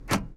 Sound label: Auto Central Lock, car central lock